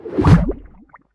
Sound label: watery bubble swoosh